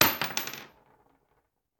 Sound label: Bullet Shell; Sound Ideas; Guns